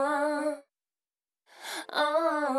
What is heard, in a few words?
Vocal